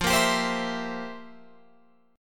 F Major 7th Suspended 2nd Suspended 4th, F, down, Guitar, Open F; strummed